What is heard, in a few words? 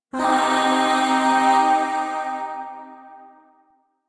Shawzin Pent Min Chord L; Music; Chords; Warframe; Zariman Void Shawzin; DE